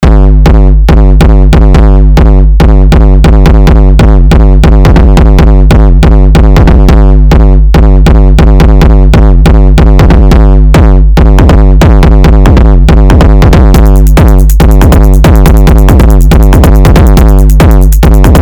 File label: Drum BAss